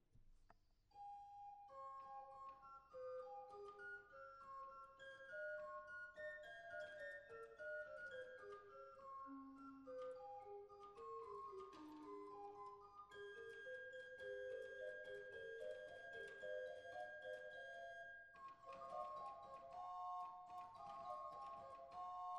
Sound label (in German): Flöte, orgelmusik